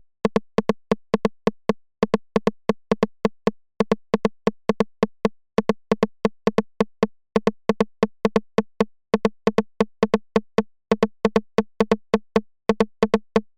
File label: Roland TR-09 Rim